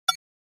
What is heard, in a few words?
Hollow Elect Tap